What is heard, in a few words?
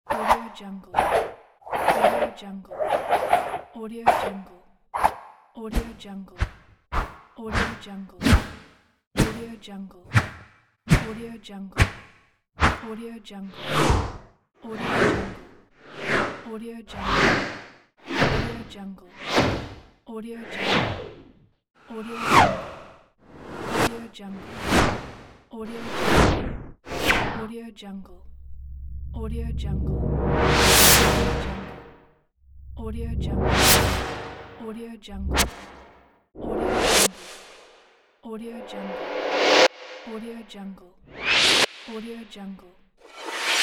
Ultimate Whoosh Pack